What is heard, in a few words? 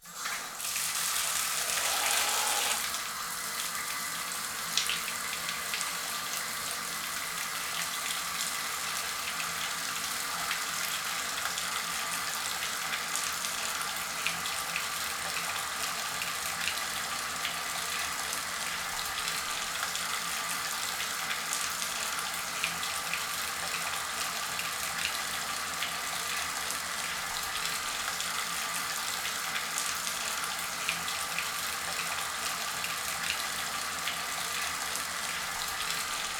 Bath filling